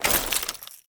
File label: Bayonet Melee Whoosh Gear Accent; Warframe; Tenno